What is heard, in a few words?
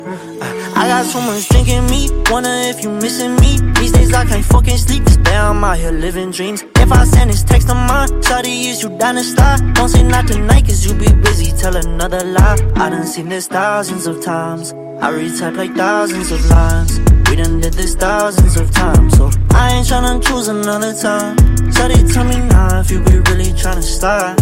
English Rap Song Ringtone